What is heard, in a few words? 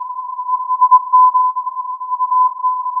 filtered input